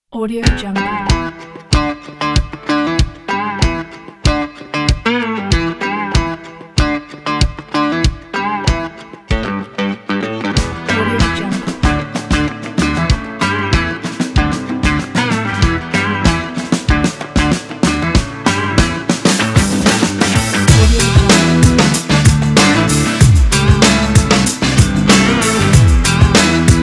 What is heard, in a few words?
the funk beat; garagesound